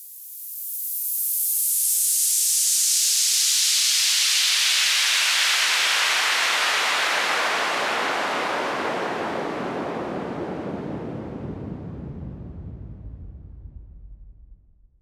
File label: Fall Clean, Falls